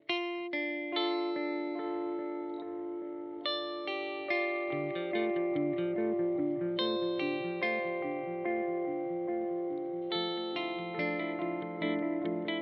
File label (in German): Boss DM 2w Delayzeit Max